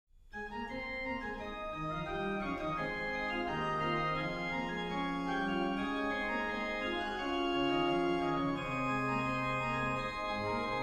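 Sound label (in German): Wie schön leuchtet der Morgenstern, Bach Organ Works Chorale Preludes; BWV 764; Individual Chorale Preludes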